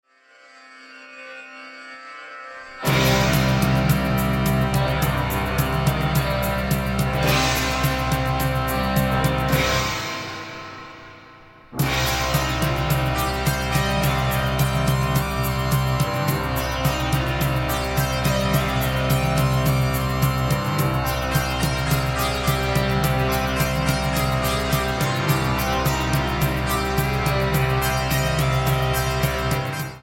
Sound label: raga